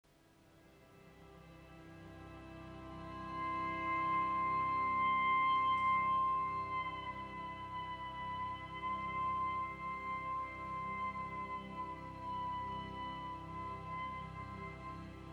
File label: dolce orchestra